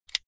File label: car flip key eject